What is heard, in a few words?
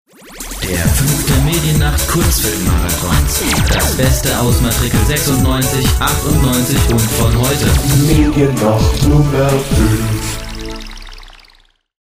psr mn5 dance